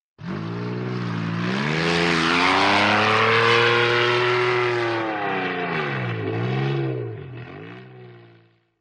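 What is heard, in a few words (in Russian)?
проезд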